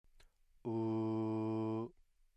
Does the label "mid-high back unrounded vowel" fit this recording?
Yes